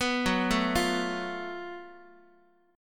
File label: Gb Minor Major 7th Double Flat 5th, Guitar, chord; arpeggiated; x,x,2,4,1,4; JGuitar